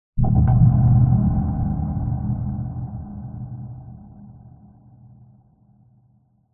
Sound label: sound design cinematic hit dark clunk reverb